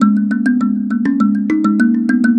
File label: Mbira Low Echo; Melodic; Ambient-Melodics-II